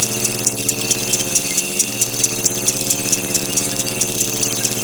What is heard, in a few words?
Timer Loop; Kahl Brother; Warframe; Veilbreaker; Devourer Kahl